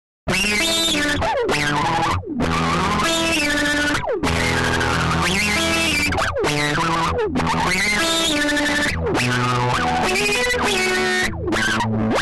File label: Earth Funky Tube Zipper